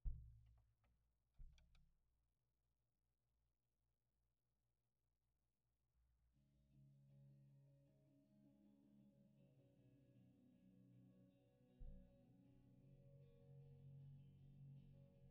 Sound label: kick